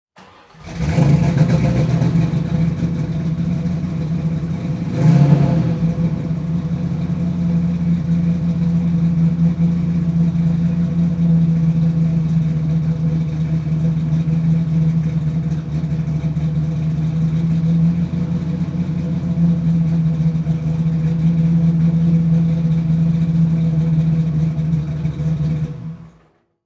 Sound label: Transportation Car 31 Ford High Boy Start Idle Off Mic In Rear